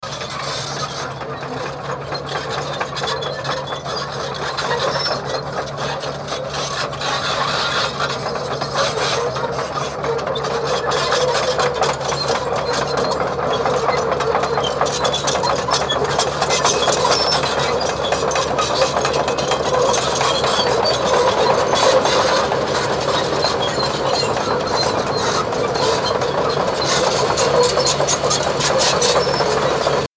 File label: dike construction